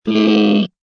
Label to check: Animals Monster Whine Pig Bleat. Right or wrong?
Right